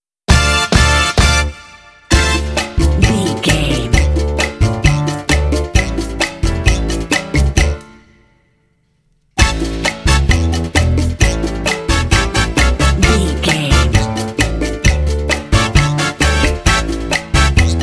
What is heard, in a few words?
cha cha cha bed